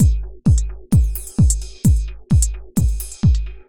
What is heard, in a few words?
Trance Beat